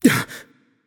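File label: nonverbal surprised; voicepack; smite; merlin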